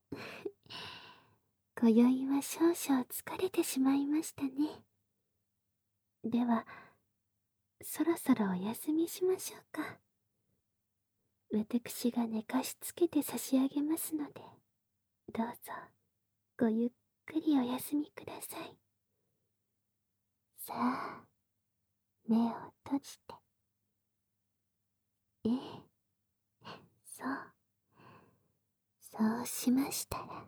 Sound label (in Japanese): 耳舐め寝かしつけ; 効果音あり